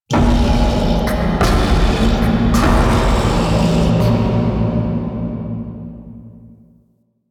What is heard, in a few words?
Knock; Entrati Labs